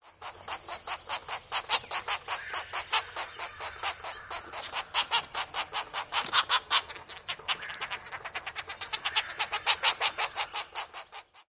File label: nestlings